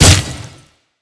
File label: ability hit; smite; god arthur